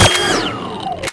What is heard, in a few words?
plasma; plasmagun; Q2